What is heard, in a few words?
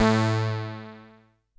JUNO BASS